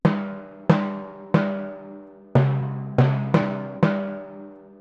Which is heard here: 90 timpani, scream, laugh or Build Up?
90 timpani